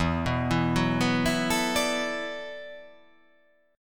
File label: E 9th Suspended 4th E down